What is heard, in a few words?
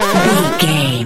retro teleport warp effect